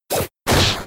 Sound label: High Jump Kick